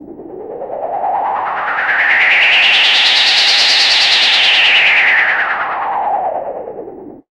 synth sfx; Chillout (ambient1&2)

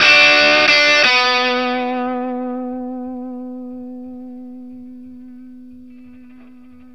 BLUESY B 60, Vintage Blues Guitar; AKAI; USB Soundscan vol.22

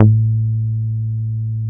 DIRECT B. A2, ANA BASS

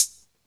HH, HIHAT HIP, Dance Mega Drums; Best Service

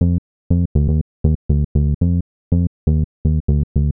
Smooth Bass (120BPM), retro house

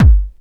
kick dance, techno trance drums

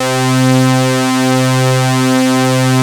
MOOG BASS C4; Best Service Dream Experience

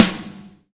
Reg. Snare; Snares

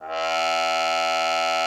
CUP MUTE, E2; Xsample VOL-3

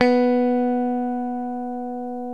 LES PAUL

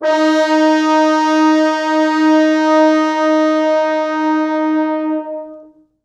4 FrHorns; D#3; East West; Quantum Leap Horns Sax; 4 FrHorns MOD

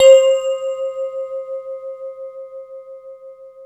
VIBRA BELLS, PPG WAVE; OLD SYNTHS; Rubber Chicken Software Sample Bank

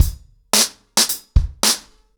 110BPM, ACOUSTIC DRUMS; The Roots Drumloops

